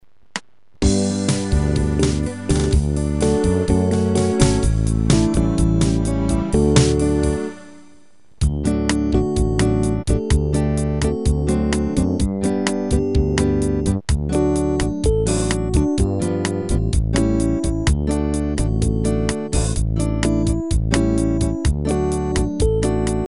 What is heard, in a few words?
Melodia